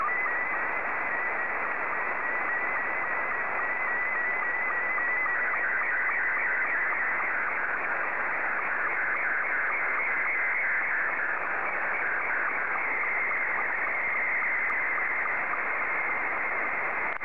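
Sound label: Scottie 2, slow-scan television; JL1FQZ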